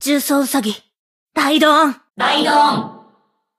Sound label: ride on